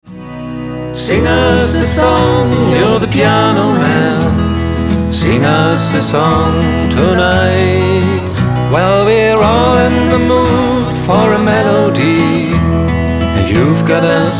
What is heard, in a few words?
piano